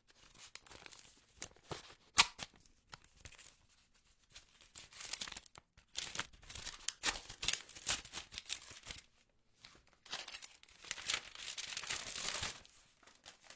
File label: Envelope opening sound effect; objects